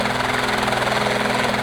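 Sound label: r44 helicopter; mcheli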